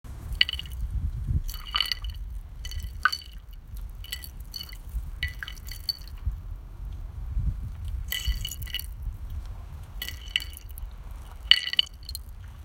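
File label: stone skimming across water, outdoor activities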